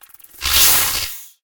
Flying Insects attack; ice age scrats nutty adventure

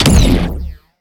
Shot Medium Laser Photon Pulsar; Robobeat

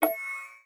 puzzle button off

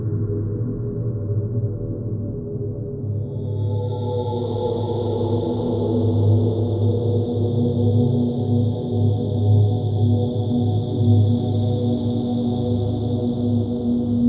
Powers Menu Ambient; Shadow Warrior 2 gamerip 2016

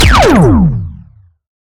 Sciweap Shot Large Laser God Ray Shooter

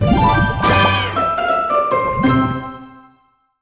Crunchy Finish

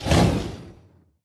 Spit; Hero Beresad Spawn

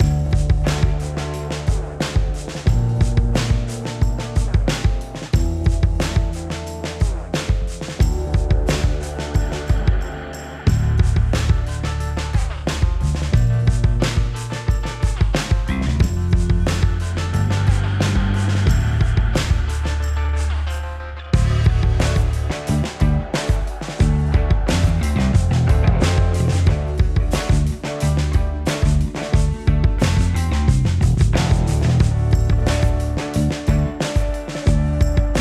Lobby 90bpm; hot lava; ios macos windows; Caldera

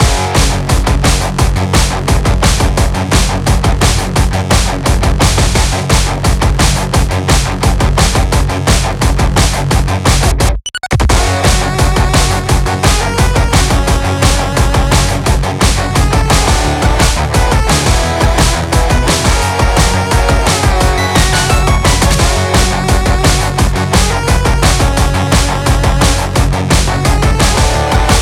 level GUITAR

